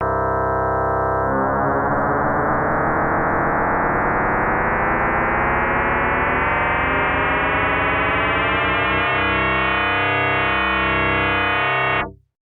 freq rising, fm-synthese

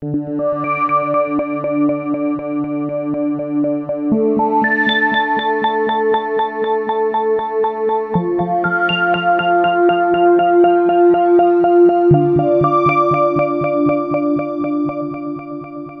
Novation Peak test arpeggio

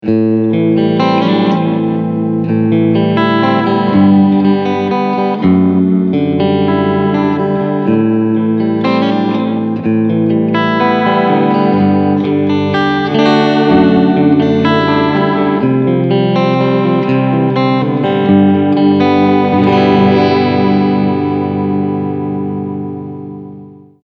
chorus; t-rex replicator

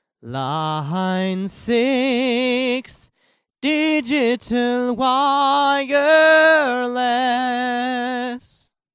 beta58 real; Line 6 XD-V70

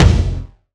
Tom

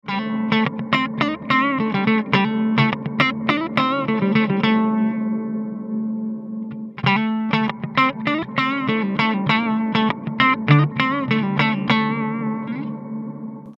spring reverb pre delay

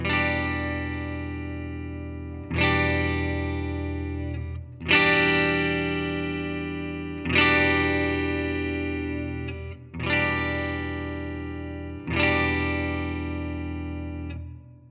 guitar match 335; Positive Grid Bias FX 2

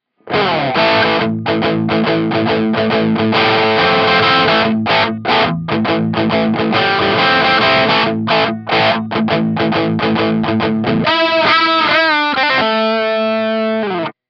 crunch; kammer tiny k

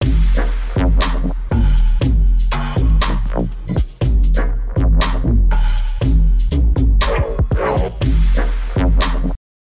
dubstep; ueberschall dubstep skillz

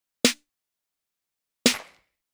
hip-hop drums snare drum

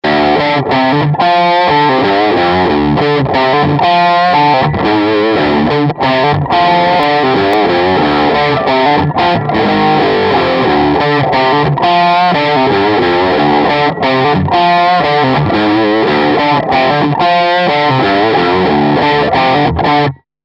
digitech gsp1101 crunch

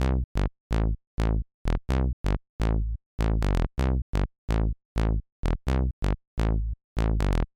synth bass kontur

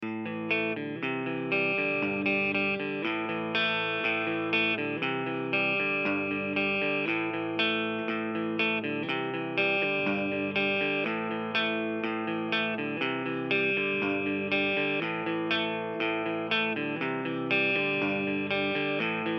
silver clean; ik multimedia amplitube 4

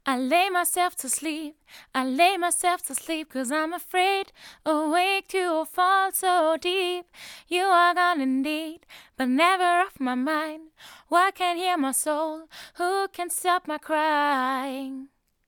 vocals

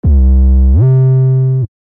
glide; 808; Der ultimative 808 Producing Guide

